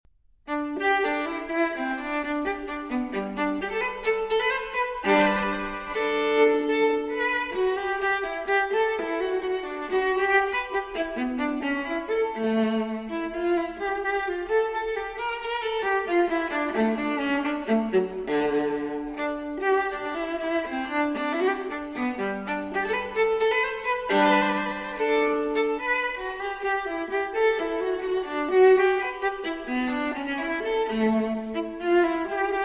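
Cello Suite No. 1 in G Major Transcription For Viola Gigue; J.S. Bach; BWV 1007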